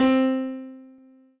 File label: Piano Ff